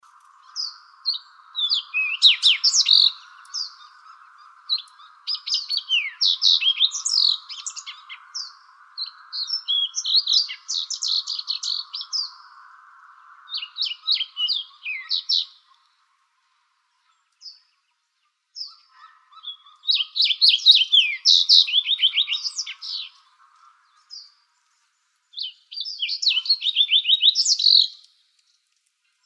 Emberizids; AZFO; deviche

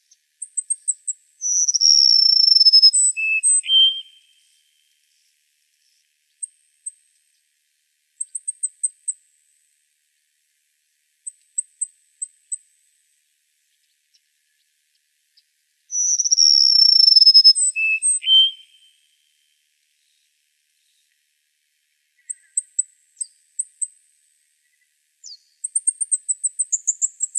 Emberizids; CASP; deviche

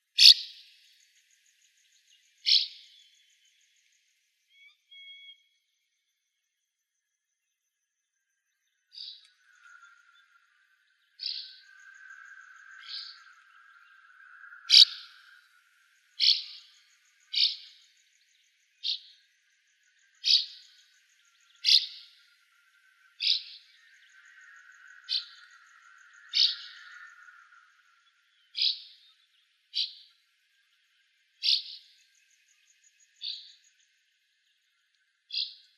NRWS, Larks Swallows; AZFO; deviche

